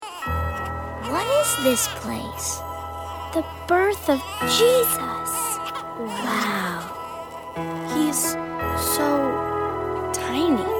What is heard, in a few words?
Ring The Bells